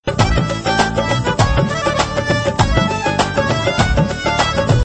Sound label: Tabala Tune